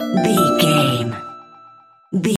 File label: jingle chime positive